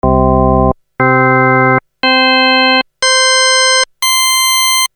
"organ" created by ADDITIVE, Korg DSS-1; main core based on 8 bits CPU Intel 8085 manages 8 voices polyphony

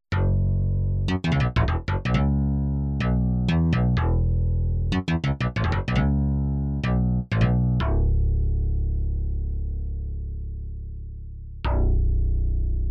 bass DX7, Bass: like a DX7, Yamaha TG500

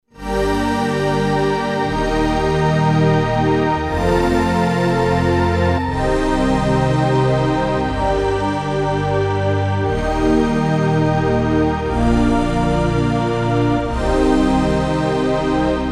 pad ambient, emu carnaval

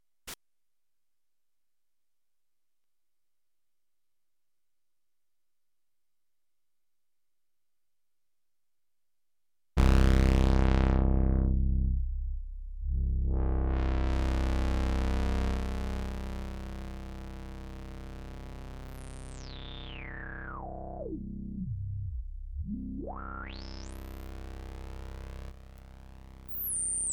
lowpass rez, Kawai sx240

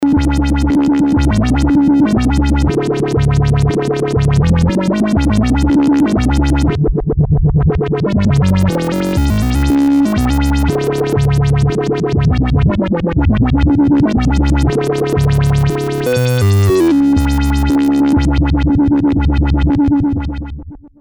synth, korg ms20

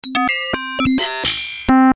pcm, roland jd800

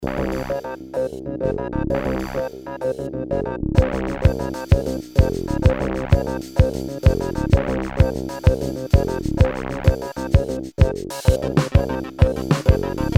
siel cruise oberheim; Oberheim DX; siel cruise mono

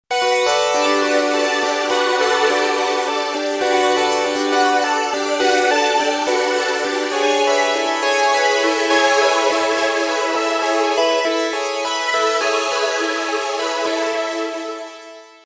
poly digital, Creamware minimax

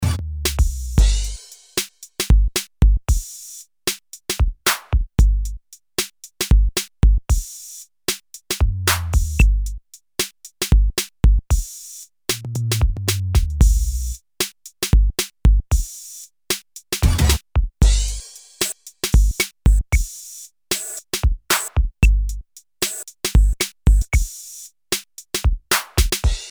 drum 808, roland jv2080

